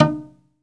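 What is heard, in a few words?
SOLO CELLO, Synclavier Strings; ILIO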